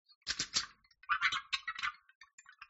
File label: compost debris; marie eve levasseur